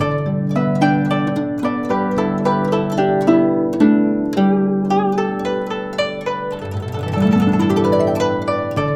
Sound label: GUCHIN 110 G; INIS - Opium